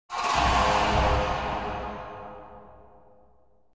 Music stinger - Hard ethnic percussion; Hollywood Edge Sound Designers Tool Kit

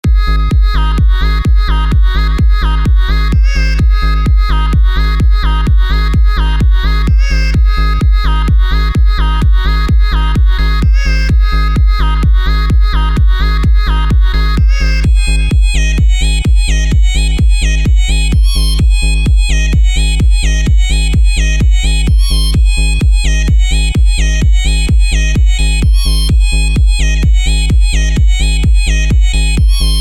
Another Sampled Synth Thing

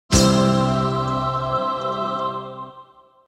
Music stinger - Choir and guitar chord end; Hollywood Edge Sound Designers Tool Kit

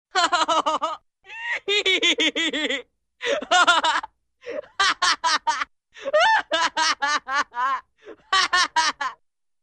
Funny Boy Laughs Heartily; Hollywood Edge Cartoon Trax

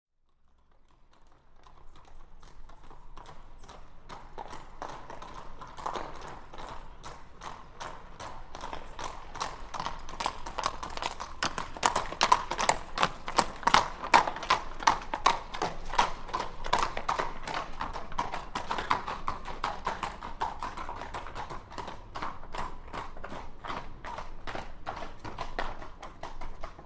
Two Horses Trot By On Cobbles; Historical; Hollywood Edge Historical Series; War Horses Armies & Weapons

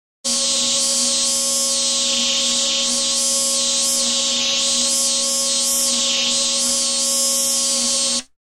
Buzz Multi-Pitched Oscillating Processed Buzz; Electronic Sci-fi

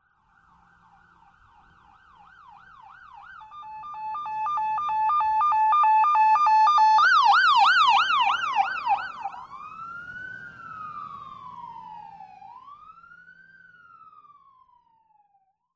Fire Siren; SOUND EFFECTS1

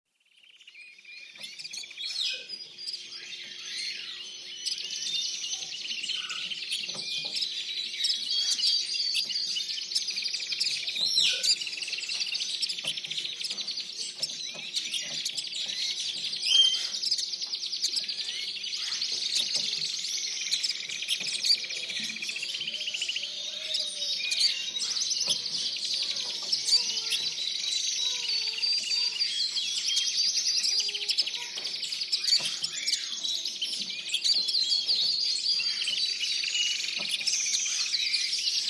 Tropical Birds; SOUND EFFECTS1

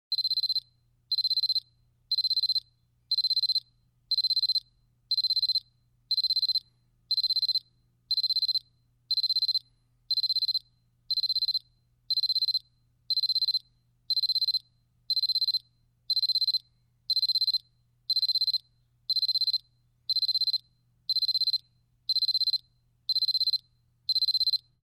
Travel alarm; SOUND EFFECTS1

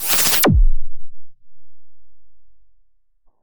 whoosh; Whooshes and sweeps

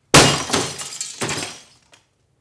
TV Drop Crash Glass Plastic Debris; Foley; Props; Foley Essentials

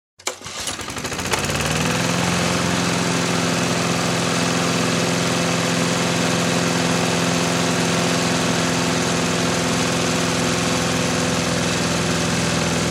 Lawn mower; SOUND EFFECTS1

